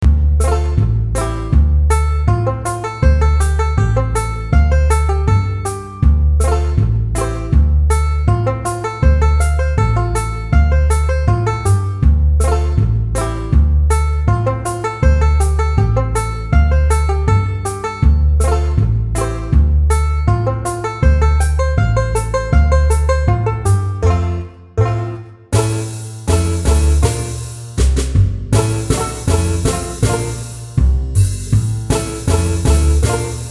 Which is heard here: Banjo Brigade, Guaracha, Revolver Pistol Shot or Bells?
Banjo Brigade